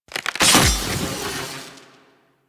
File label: Sword Break Partial; Cinematics; Stalker; Warframe; Orbiter Cradle